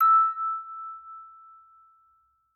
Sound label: orgel